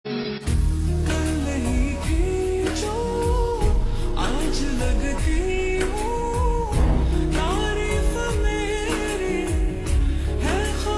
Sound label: Slow Reverb Version Ringtone